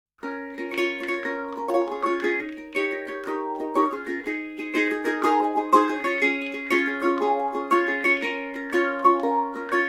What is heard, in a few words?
wahwah